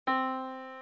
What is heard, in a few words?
Yamaha Disk, piano sound